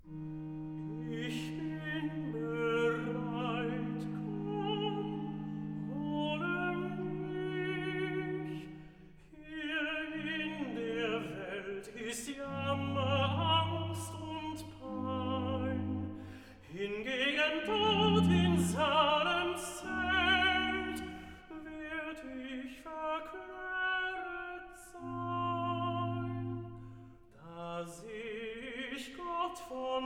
Recitative (tenor); Johann Sebastian Bach; Auf Christi Himmelfahrt allein BWV128; Archiv

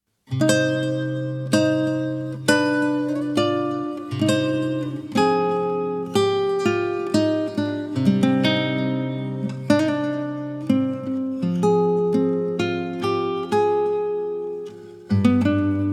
Guitar Solo

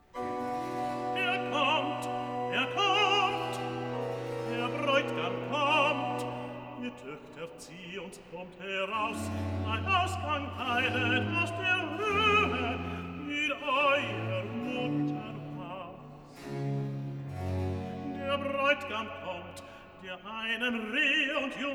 Cantata, Recitativo (Tenor); BWV 140 'Wachet auf, ruft uns die Stimme'; II. Recitativo (Tenor) 'Er kommt, er kommt'; Bach; Winchester; 23rd Sun After Trinity

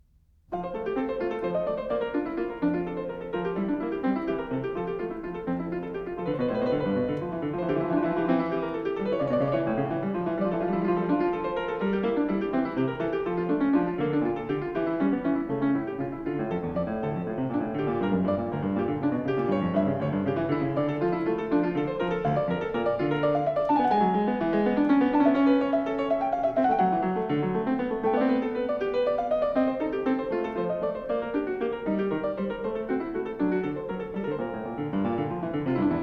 Prelude No. 11 in F major; BWV856; Bach; Glenn Gould; The Well-Tempered Clavier; WTC Vol I

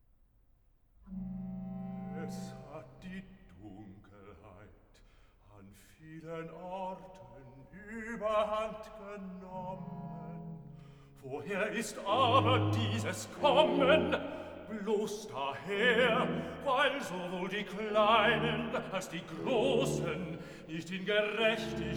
Cantata, Recitativo (Bass); BWV 6 'Bleib bei uns, denn es will Abend werden'; Bach; Eisenach; Easter Mon & Tue

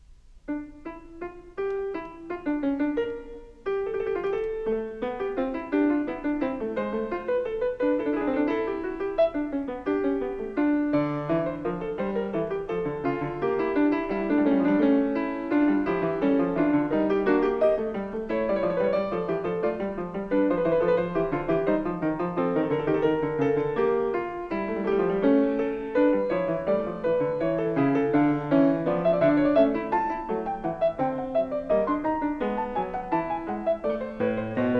Fugue No. 6 in D minor; BWV851; Bach; Glenn Gould; The Well-Tempered Clavier; WTC Vol I

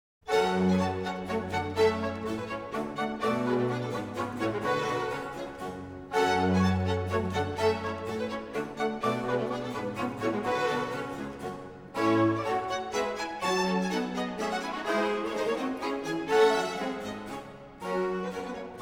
Hornpipe; Third Act Tune; Choir, English Baroque Soloists, John Eliot Gardiner; Semi-opera Z. 628, "King Arthur, or The British Worthy"

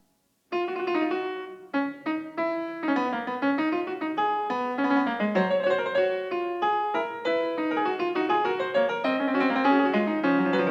Fugue No. 13 in F-sharp major; BWV882; Bach; Glenn Gould; The Well-Tempered Clavier; WTC Vol II

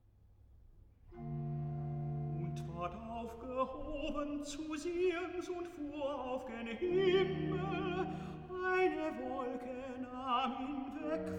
Recitative (tenor); Johann Sebastian Bach; Lobet Gott in seinen Reichen BWV11; tenor Evangelist; Archiv

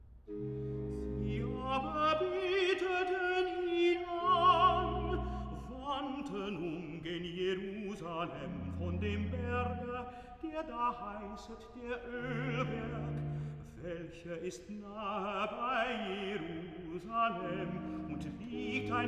Recitative (tenor); Johann Sebastian Bach; Lobet Gott in seinen Reichen BWV11; tenor Evangelist; Archiv

